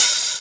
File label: Open Hihat; Vengeance Samples; VEE Cymbals